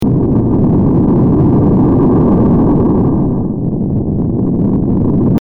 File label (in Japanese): 地響き ループ